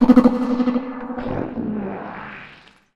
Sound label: sound design transition freaky weird gremlin